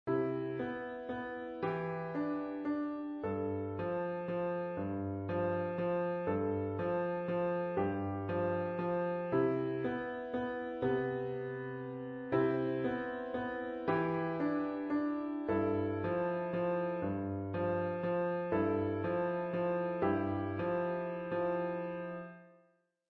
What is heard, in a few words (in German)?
Mystischer Waltzer